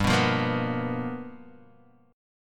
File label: G 7th Sharp 9th G chord; G7#9 strummed; JGuitar